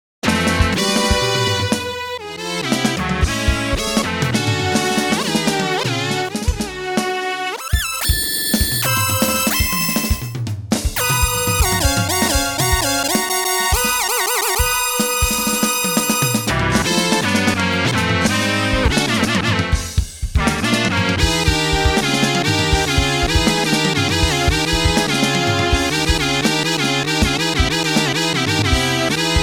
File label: Synclavier V